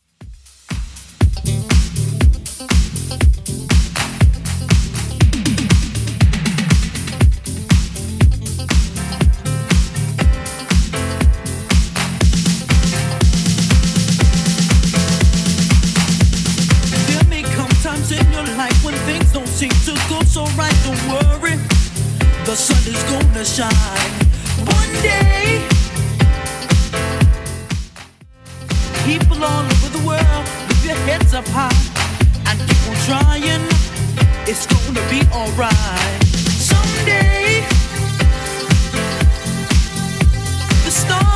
80s Redrum 120bpm

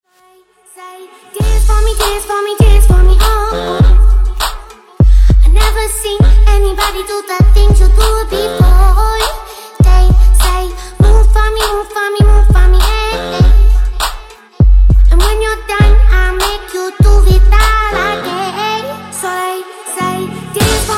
Moombah Twerk 100Bpm

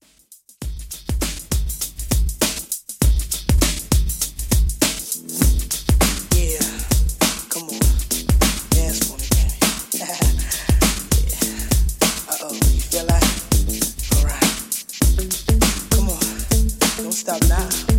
90s Extended Redrum 100 Bpm Clean

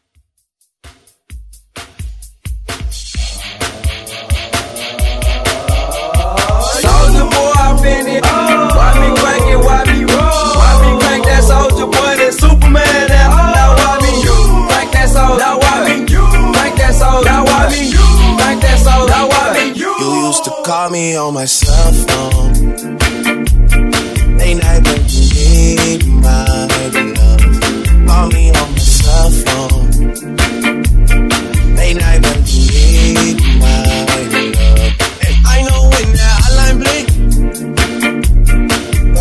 Drum Hype

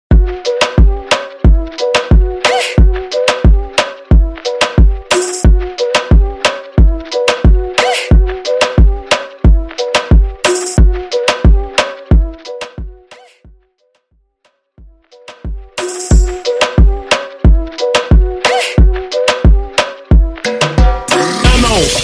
Moombahton

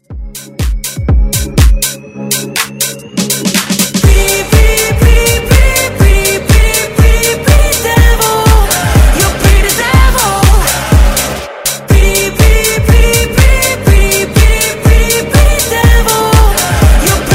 House Redrum; remixplanet

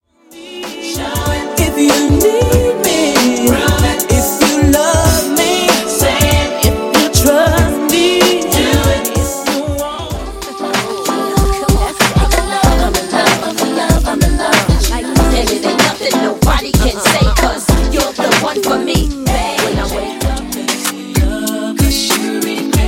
Hip Hop RB Old school Mix Megamix, 95 Bpm

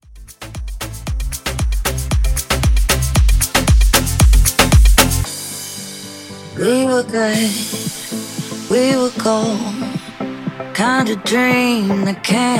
Hybrid Mashup 115

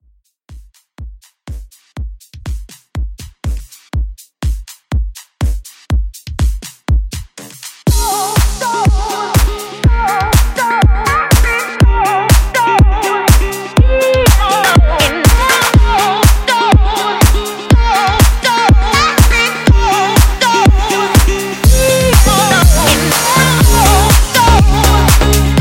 Future House Redrum

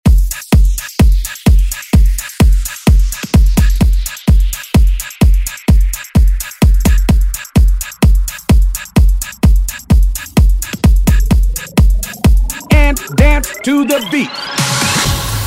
Dance

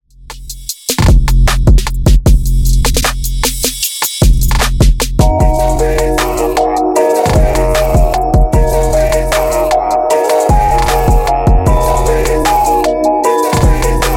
Hype Redrum 153 Bpm

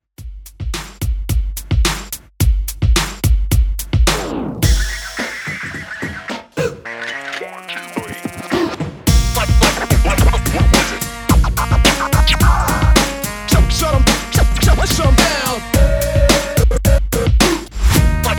Scratch Tiktok Redrum

